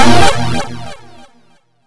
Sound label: Retro Electronic Burst